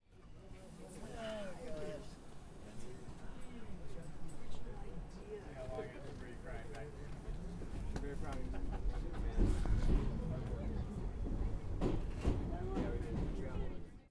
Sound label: Ambiance NYC Subway Train Walla